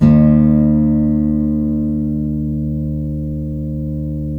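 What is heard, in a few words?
GUILD 12-string, Guitars; Spectrasonics; Hans Zimmer Guitars VOL-1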